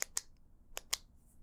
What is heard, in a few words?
Pen Cap Clicks; bb - Books, Paper, Writing